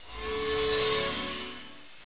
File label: faerie fire launch; warcraft3